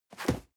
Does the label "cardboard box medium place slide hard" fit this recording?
Yes